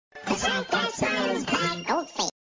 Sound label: goldfish jingle hulium baloon